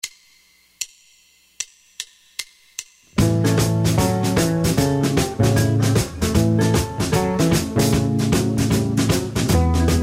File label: Stille Nacht Blues Bass Solo Version